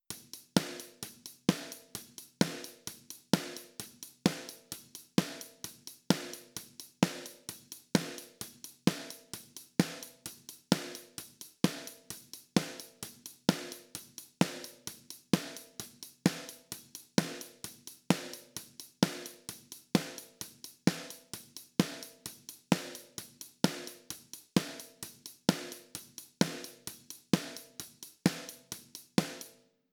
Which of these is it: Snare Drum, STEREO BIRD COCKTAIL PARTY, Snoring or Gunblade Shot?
Snare Drum